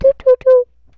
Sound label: doo doo doo, gibbrish; Voice